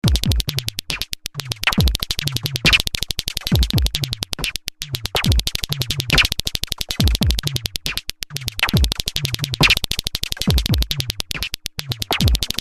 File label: LFO; deMOON V 1 4